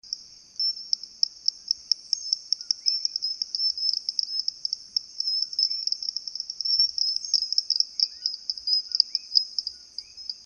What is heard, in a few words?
E locustus, coqui